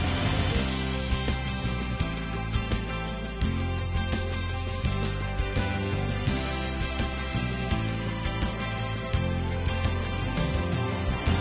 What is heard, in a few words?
Ingame Normalloop; Tangled